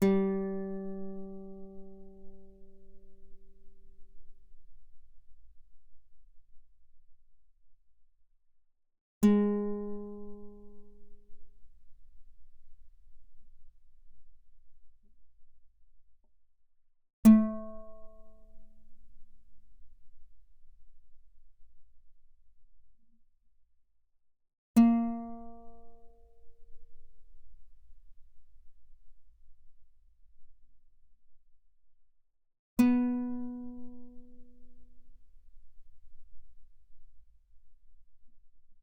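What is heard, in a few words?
Guitar fortissimo sul G G3 B3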